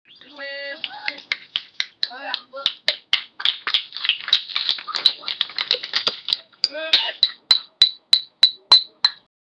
medieval, tavern

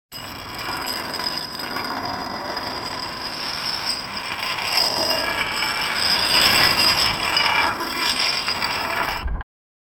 sound of a long metal, rod

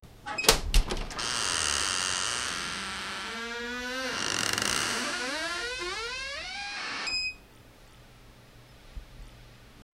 warm and welcome sound of, theater

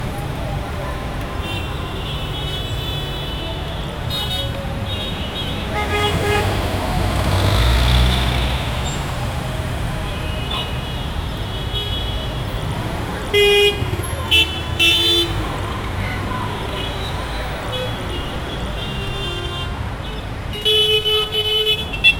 faint indian street sound

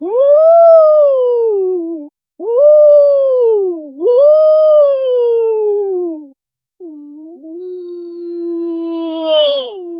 an adult penguin vocalizi; vocalization

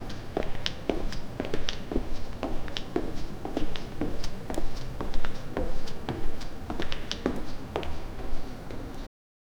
slow deliberate heavier b, night

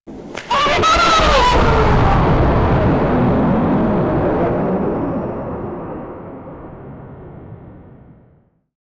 superhero launching into

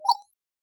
reward

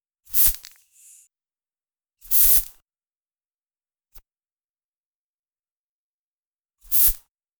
a single pristine luxury, perfume

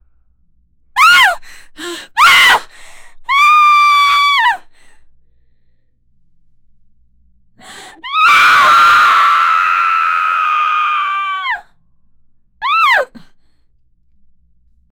after panting a little th, action

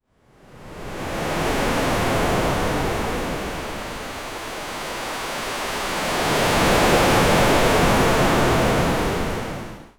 ocean waves crashing on

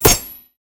impact

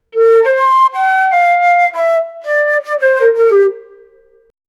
a three note flute tune

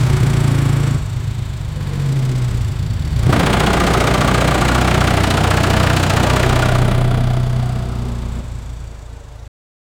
large boat engine turns o; watercraft

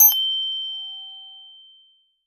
a high pitched crystal cl

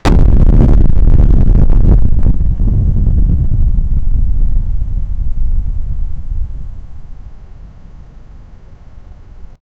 violent warzone ambience; clipping

